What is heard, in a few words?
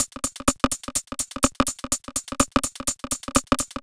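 percussion, Drum Loops 125bpm, Nu Dance Electro; Breakin Riddim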